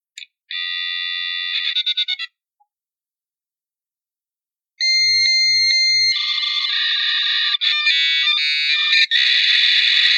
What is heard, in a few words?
twang